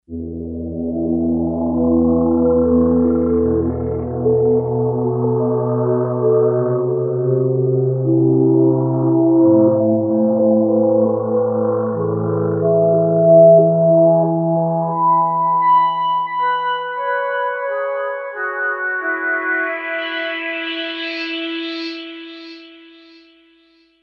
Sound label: Kawai K5000S; factory presets; Marais